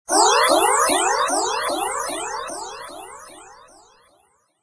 alien alarme

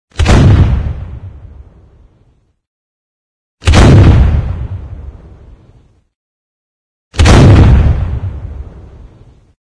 canon, ambience

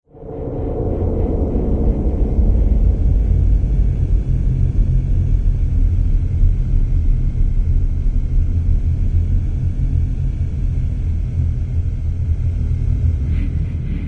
bruits lointains, ambience

